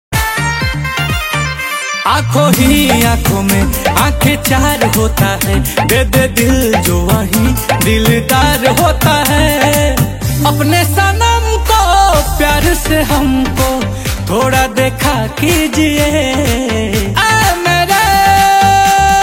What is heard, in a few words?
Bhojpuri Song